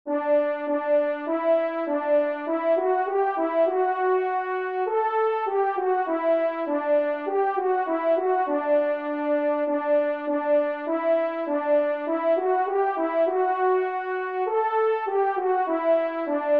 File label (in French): Cotillon Do Maj TRP; 1e Cotillon; Corrette